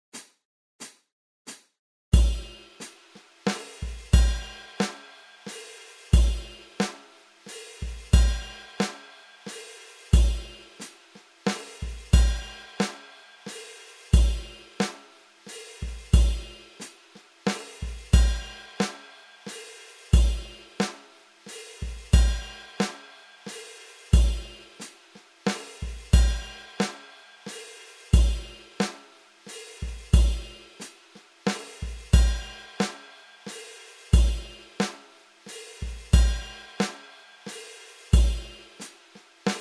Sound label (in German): 3 4 takt 90 bpm, metronom